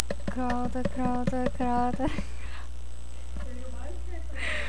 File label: crowd